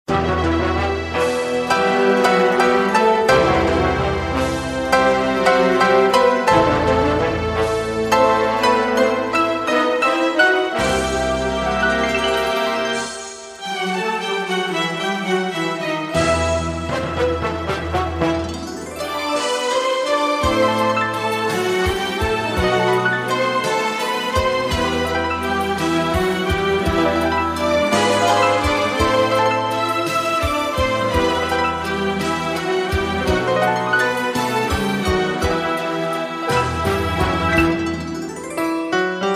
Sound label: Piano Violin